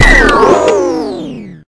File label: railgf; GZDoom